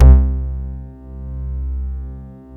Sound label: BASS C2; Q Up Arts; Dream Experience